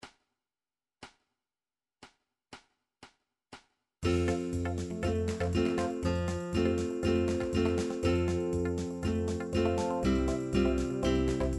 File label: traditional zumbala, midi